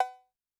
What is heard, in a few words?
CowB; Yamaha DTXpress-II; Perc